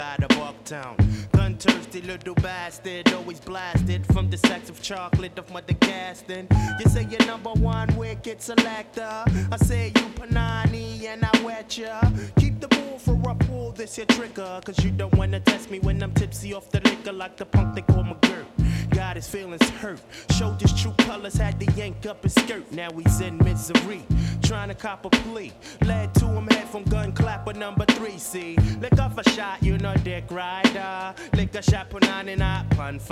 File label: Vocal